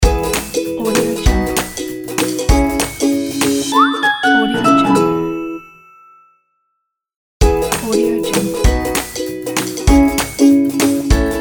the happy intro logo

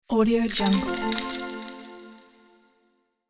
treasure gold revealed

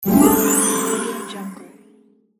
use magical item; gamechestaudio

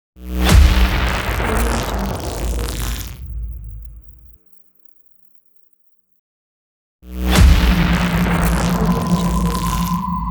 electric impact logo

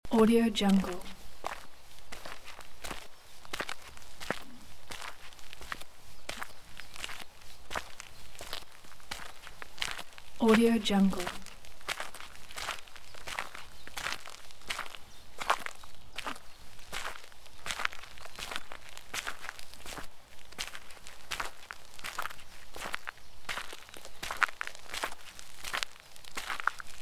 footsteps on gravel forest road

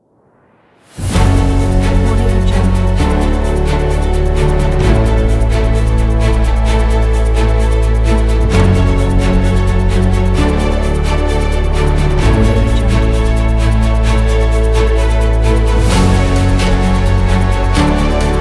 epic corporate

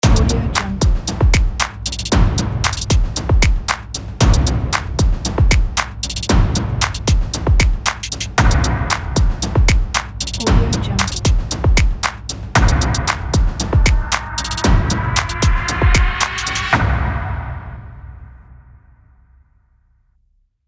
epic stomp clap snap logo

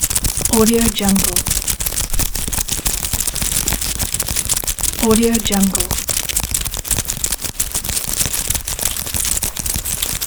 bug steps loop

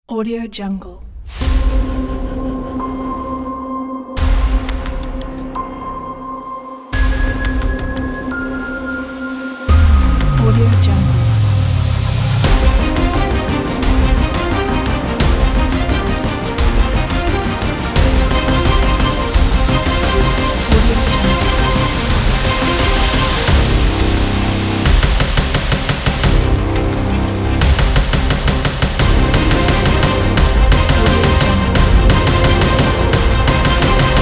movie trailer

